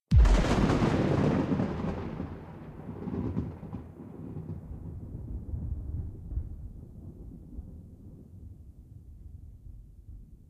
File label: thunder, weather; ambient; minecraft